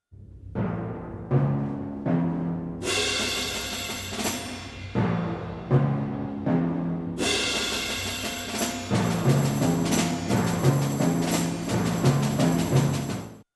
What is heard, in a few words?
percussion